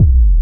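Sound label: hard bass drums; Bazzdrumz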